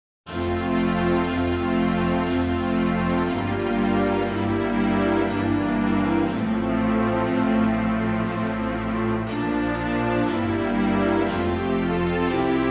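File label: strings F major instrument; ombra mai fu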